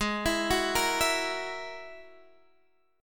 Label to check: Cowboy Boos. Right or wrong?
Wrong